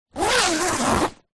foley bag backpack zipper; zapsplat

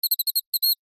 animals birds chick baby tweet designed

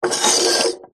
beer can empty slide table; food drink; zapsplat

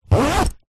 lunchbox fabric zipper; foley

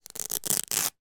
shin pads velcro rip; foley

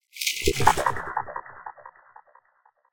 sound design liquid delay filtered; sound effects one

